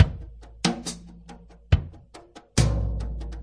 070 bpm flattened sparse beat, drum loops and breaks